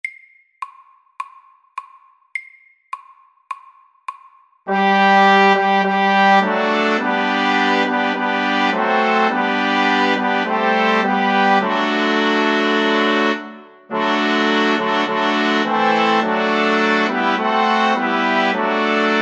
Fanfare for tre MIDDELS

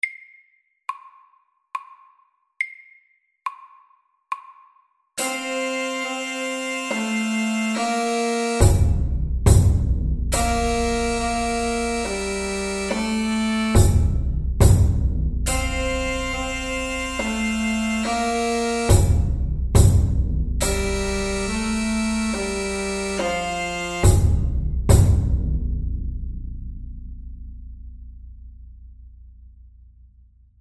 Stomp SAKTE

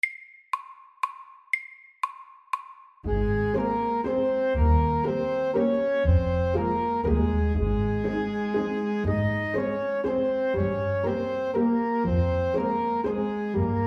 Legato vals FAST

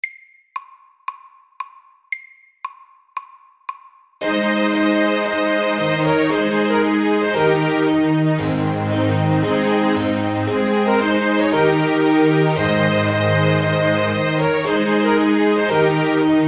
Trumpet tune MIDDELS

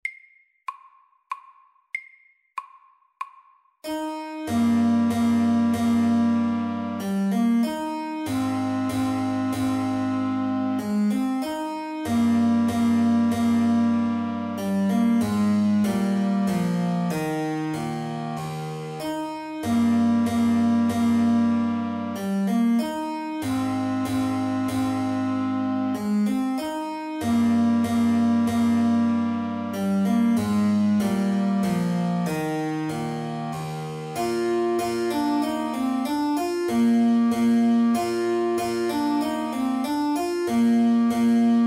Menuett SLOW

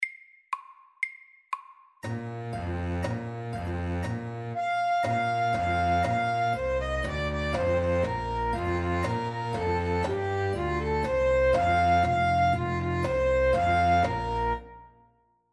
Folketone MIDDELS

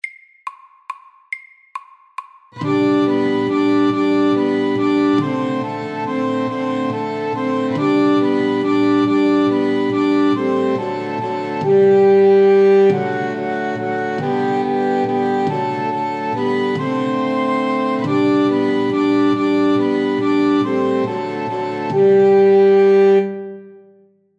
Irsk melodi

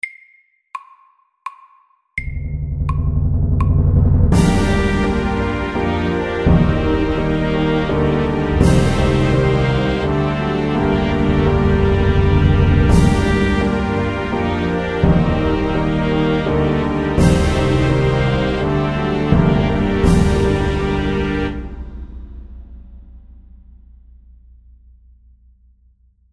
Filmmusikk, 84

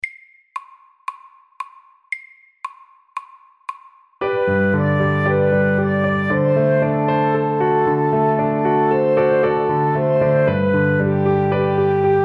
Walesisk melodi FORT

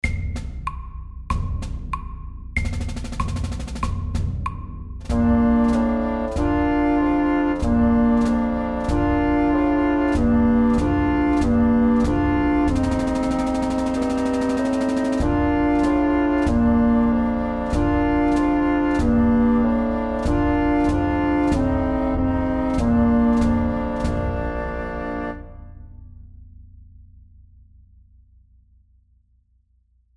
Skolemarsj MEDIUM 95

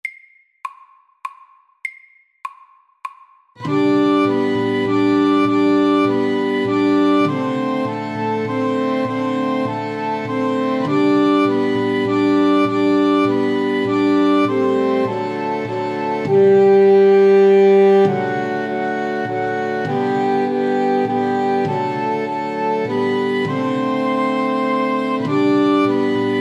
Irsk melodi; SAKTE

